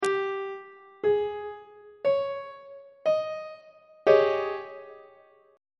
a flat major 7th suspended 4th 3rd inversion on treble clef